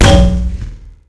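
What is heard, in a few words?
grenade; quake2; weapons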